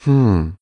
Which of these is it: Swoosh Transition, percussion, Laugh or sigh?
sigh